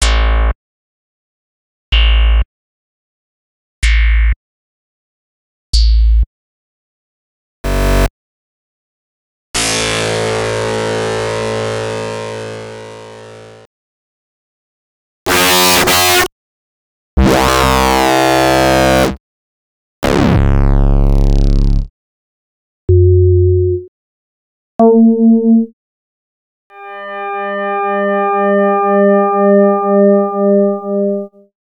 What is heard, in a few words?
Bass+Pad; X-Static Goldmine 5